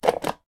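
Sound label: foley big plastic button switch press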